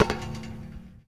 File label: Street Ball Metal Back, Street Basketball